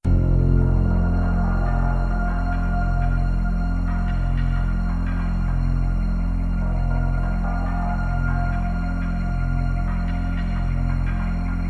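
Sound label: Music Tension; Knuckle Sandwich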